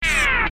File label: Hiphop Slide Close